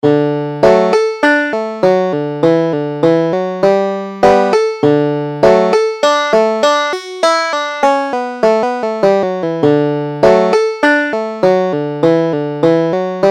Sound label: galway hornpipe, banjo